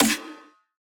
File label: Snare, Dubstep Snares